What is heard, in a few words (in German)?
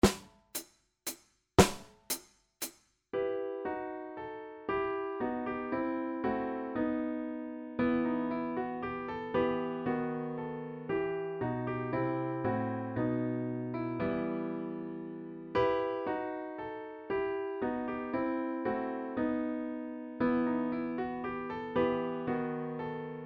Menuett 116